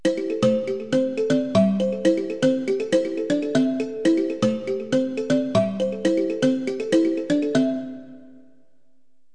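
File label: kalimba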